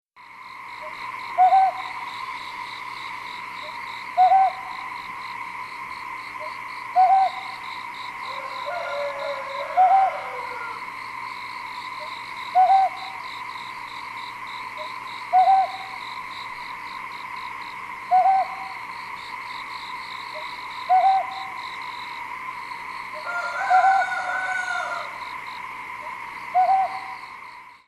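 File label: Ryukyu Scops Owl; okinawa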